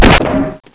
bomb deploy; oberon; cstrike

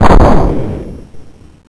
fire sil, deagle; weapons

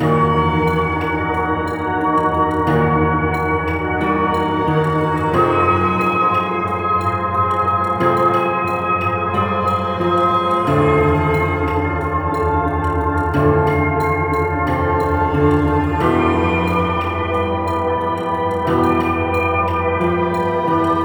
ice piano loop; svencoop

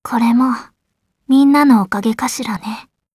cheer, voice commands; svencoop; Blanc

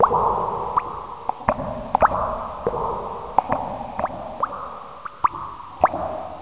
canyon drips; svencoop; jungle

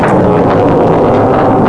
alien fazzle, ambience

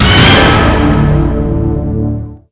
sewer systematic music

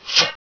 blowdart; gearbox; darts

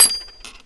shell; tfc; player.kilgore

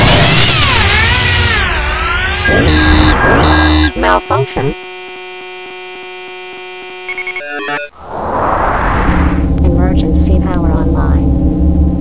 engine die

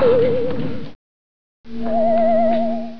screen; tardis

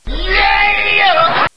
Spin; dod; mapsounds

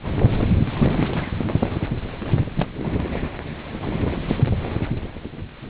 flap, ambience

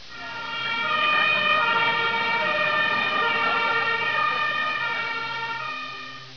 dead firetruck

